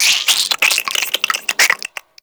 Infested Minion Attack; Warframe

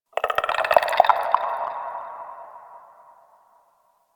Infested Tendon Chatter; Warframe; Corpus Infested

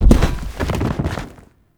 Beam Battle Fall; Quests; The New War; Ballas Lotus

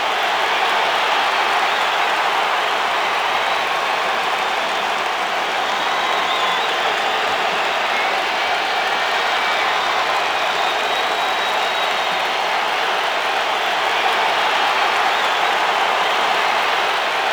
Stadium Entrance Crowd Loop; Warframe; Quests; NineteenNinetyNine

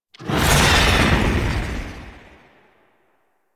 Fly By Quick, Vehicles Flight; Blue Sky Liset

